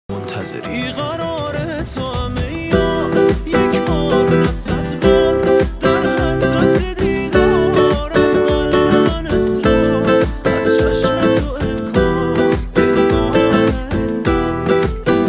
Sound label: Song, midi guitar rhythm; Tria